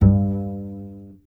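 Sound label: string contrabass G; Miroslav Vitous; Solo Instruments VOL-2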